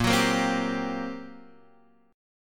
G Minor Major 7th Double Flat 5th, Bb

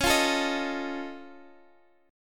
F Augmented 7th C sharp, chord

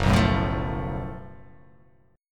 F sharp Minor Major 7th Double Flat 5th, guitar

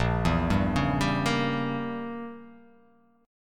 A sharp Diminished 7th, chord

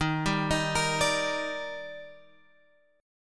Eb Major 7th, down Guitar Open G

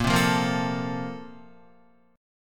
Bb Major 7th Suspended 2nd, Guitar; BbM7sus2 strummed; JGuitar

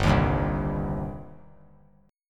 G Suspended 4th Sharp 5th G, down; Gsus4#5 strummed; JGuitar

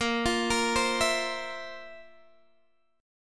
Bb Suspended 2nd Sharp 11th Bb; arpeggiated

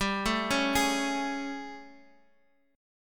A Major 7th G; AM7/G Chord {X X 5 2 2 4} arpeggiated

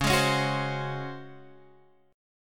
C sharp Major 7th Suspended 4th C sharp

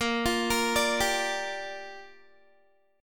A sharp 6th, Guitar; A#6 arpeggiated

